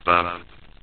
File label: bump; edge extended; android gamerip 2012